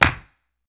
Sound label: CLAP, Human Perc, Body Perc